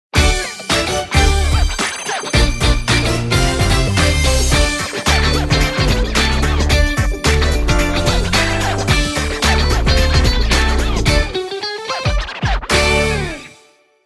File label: Hip Hop